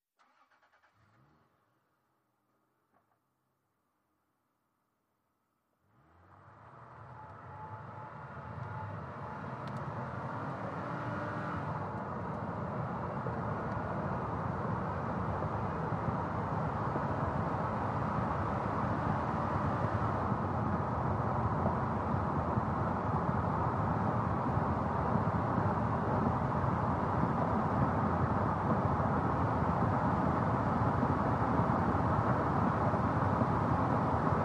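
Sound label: SUV Engine Accel 100mph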